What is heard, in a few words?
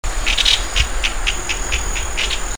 chloroceryle amazona flush